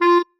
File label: marimba penguin; ゆめ2っき